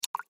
Water Drip Water Single; Dripping; Blastwave

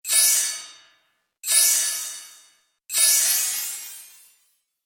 Bright Airy Sparkle Spells, magic; Twistedtracks

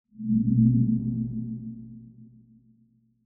Ghost Whoosh Low Filter; Horror; Ghosts; Blastwave

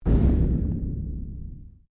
Strike Metal Hollow Tank Soft; Materials

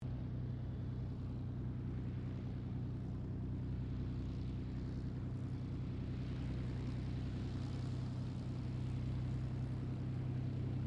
AIRCRAFT P-40F KITTYHAWK TAXI DISTANT; Warhawk/Tomahawk; Airplane; Vehicles; 1942

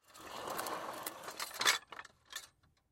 Croquet Cart Push Rattle; Blastwave

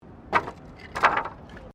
Wood Move

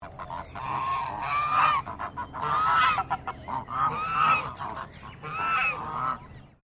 BIRD GEESE CHINESE HONK; Birds from Collingwood Children's Farm; Animals

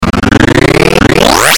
Ascend Thick Crunch; Blastwave

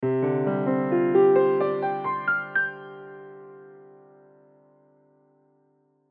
Piano Ascend, Piano Ascend Slow Sustain Arpeggio; Musical; Pianos; Blastwave; Sound Effects:Musical

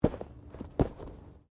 Body movement Foot Foley

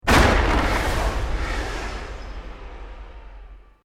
EXPLOSION MEDIUM WOOD

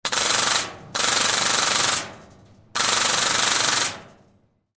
Carnival Gun Game Rapid Fire Multiple Shots; Amusement Park; Sports; Amusement Park Sound Effects; Blastwave

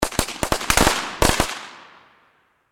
Explosion Firecracker Multiple Close; Fireworks; SFX Bible

